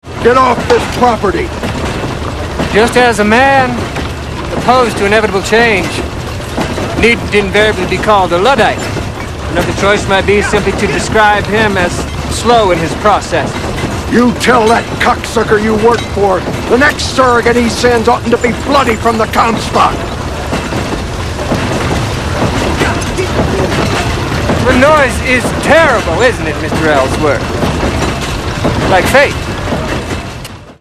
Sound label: terrible noise